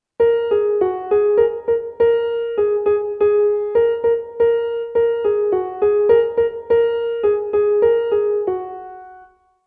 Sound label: Merryly ewe roll along